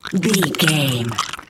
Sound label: zombie eating chewing